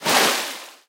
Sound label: Surf Turn; Talking Tom Gold Run